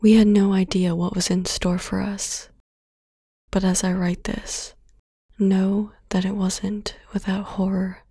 Cinematic Female Vocal Sample

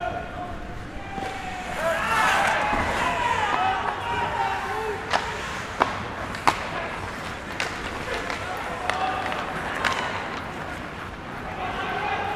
Icehockey

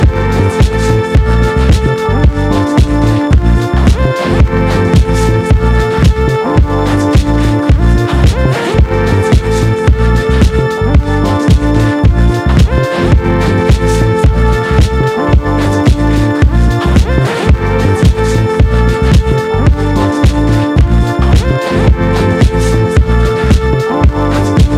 tight late nite disco

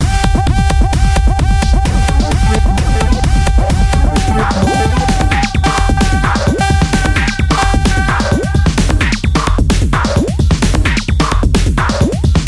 Dance World

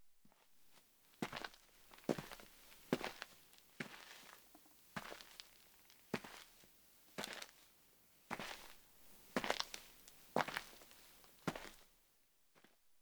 Footsteps Shoes Sneakers Dirt Walk Slow Nice Grit

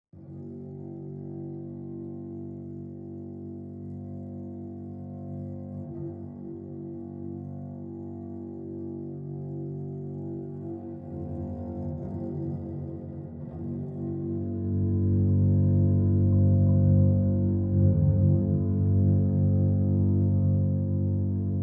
Low Cello Bass Note

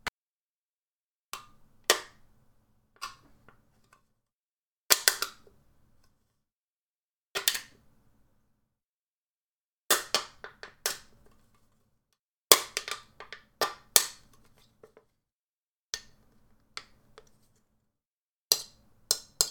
Can Stab

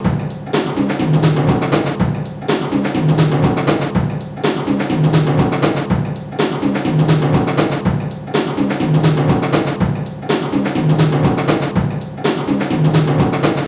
Drum Loop

